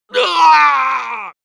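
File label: pain severe, Spanish; Soldier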